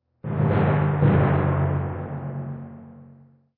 Tymp Hits

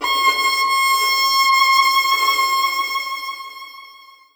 Piano Walkway Violin C6; xbox series xs; gamerip; super crazy rhythm castle; ps4 ps5 switch windows

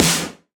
Snare House

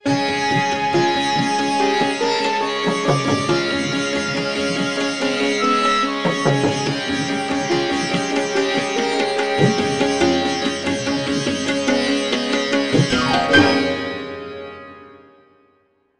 Tuba

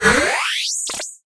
Charge; Chaser; Robobeat

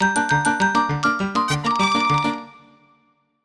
Hurry; Haunted1

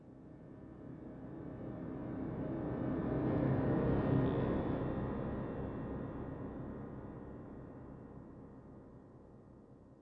Amb Drone

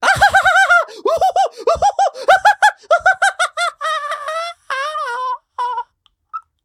death final; Collector

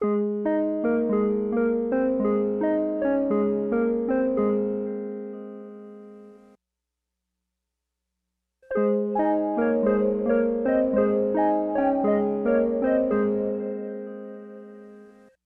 Forest Bells; bugsnax

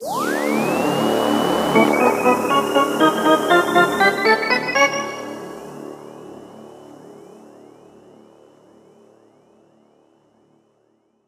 BGM Space Entrance; tamagotchi no doki doki dream omisecchi 3ds

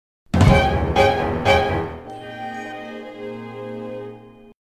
Dramatic

